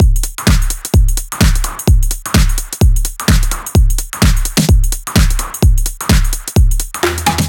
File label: future bass house loop